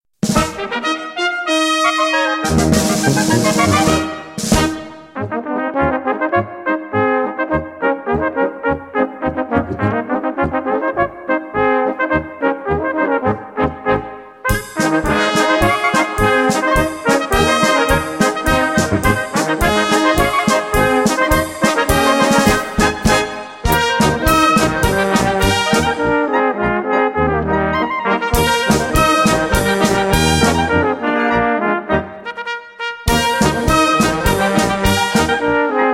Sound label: polka